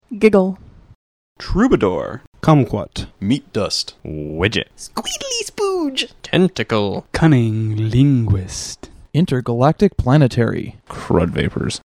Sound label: giggle